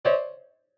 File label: piano Ding; Pineview Drive